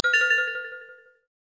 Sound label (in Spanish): Simple, Notificaciones